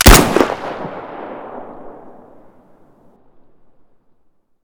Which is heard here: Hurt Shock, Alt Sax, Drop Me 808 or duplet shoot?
duplet shoot